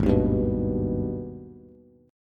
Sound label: G sharp Minor Major 7th G sharp down Bass; strummed; JGuitar